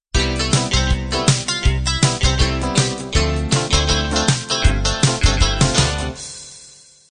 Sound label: 60s pop intro